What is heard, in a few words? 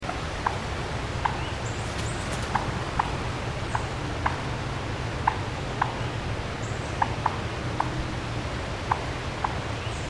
Wild Turkey Meleagris gallopavo